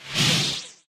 lightsword turning off; soundscrate

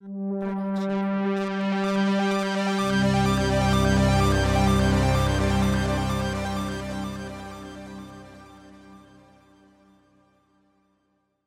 Inspiring Sci-Fi Ident; SoundsCrate

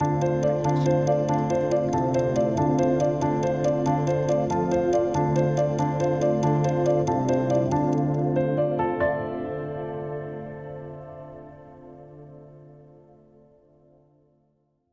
News Ident Tick Tick; SoundsCrate

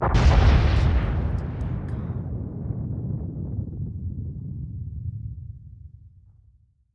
volcano; soundscrate

